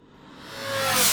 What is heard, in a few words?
fx cinema